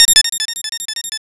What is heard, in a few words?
flash; mother2